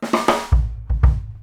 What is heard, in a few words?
KICK SNARE, Live Snares; PlatinumPhattCD1; E-MU Producer Series Vol. 8 – Platinum Phatt